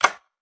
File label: wooen button